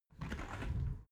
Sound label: window open soft